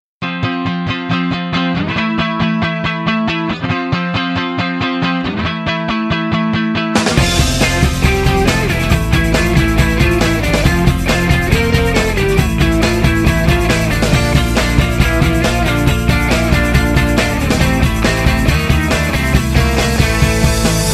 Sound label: Melody Tone